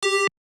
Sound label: dial tone; Oppo R7